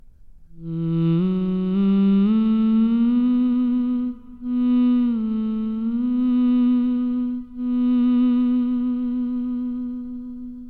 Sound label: mortal hum